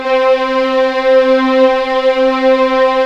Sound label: C4 EXP MF, VIOLINS; East West; The Ultimate String Collection